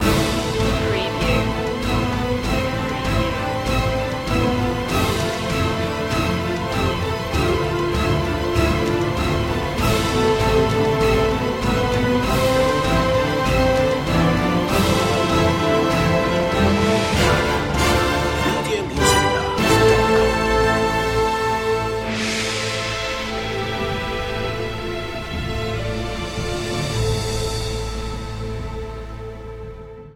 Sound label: no choir